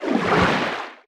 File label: creature trivalve loop upright